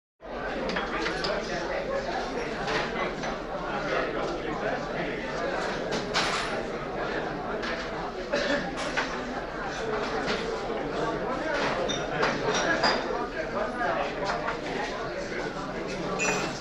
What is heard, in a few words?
Bar; Pub Chatter And Atmosphere. Bottle And Glass Chinks Etc.; bars & discos